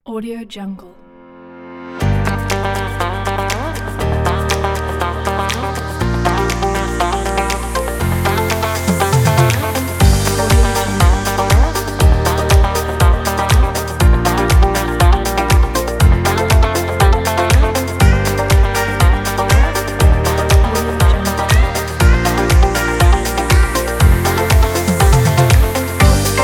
upbeat fashion pop; smartsounds

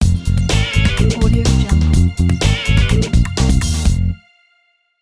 afternoon news show opener logo

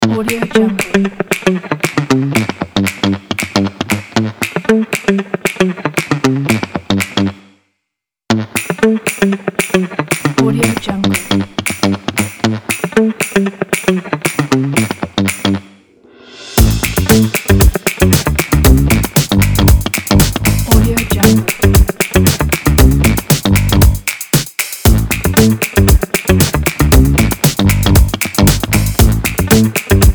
energetic fun quirky upbeat funk

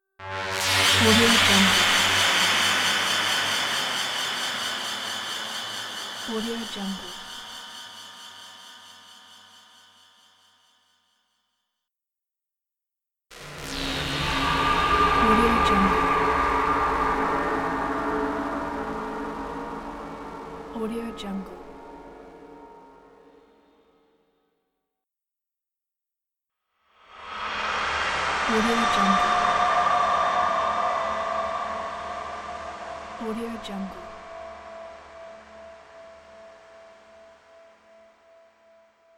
angst movie sound; audioefx

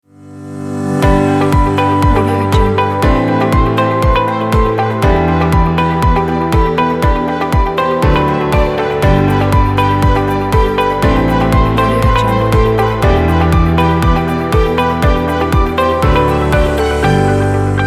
business intro logo

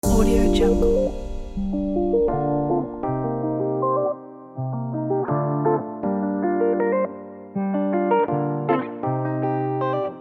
upbeat vlog

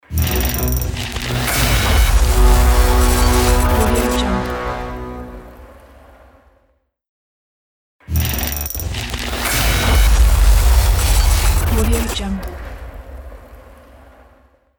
fire glitch explosion logo; matsteiner-fx

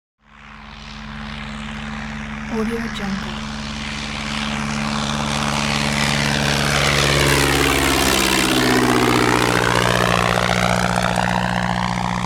tiger moth prop aircraft flying overhead; hollywoodedge

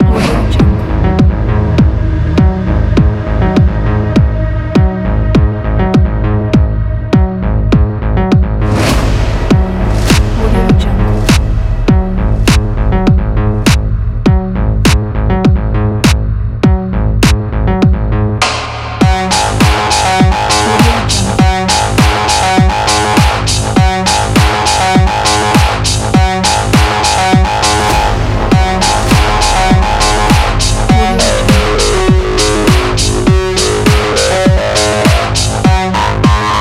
hard rock cyberpunk

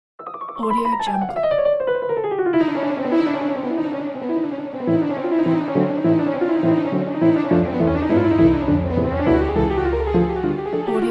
flight of the bumblebee cartoon fun; gballx

